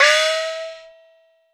Shou Luo; Cymbals

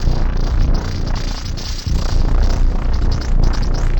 Reactor Core, Machinery; Sonic Foundry (Sony Creative Software); Myths of Technology

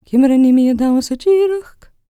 GAELIC, World Voices, GAELIC FEM

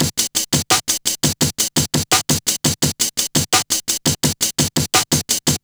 Kickin Break, Drum & Bass, Beats & Breaks

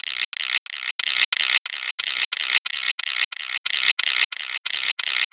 Zero Ratchet; Beat Components

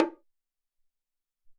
Bong Hi O, Alesis SR-18

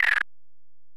EPerc, Alesis DM-PRO

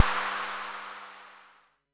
FX; MPC DSM-2

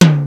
Ensoniq EPS Tom

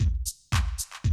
Classic Drum Loops

